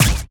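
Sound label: Laser gun Single; Lasrgun Loki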